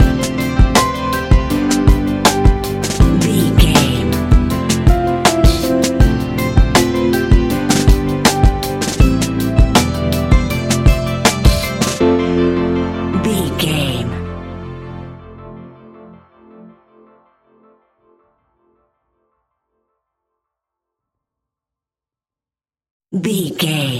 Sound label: serenade slow motion, A relaxed and chilling piece of music